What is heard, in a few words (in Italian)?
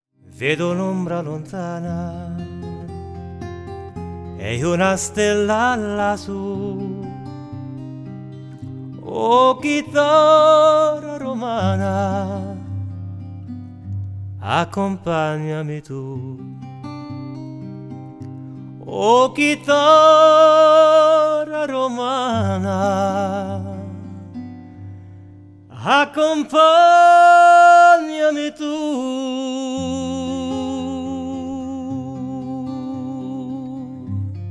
Chitarra Up; Ruffolo